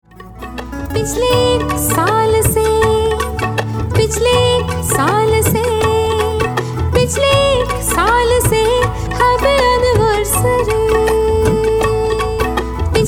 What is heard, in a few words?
ANNIVERSARY SPOUSE TUNE HINDI FEMALE